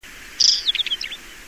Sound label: Oenanthe oenanthe (song)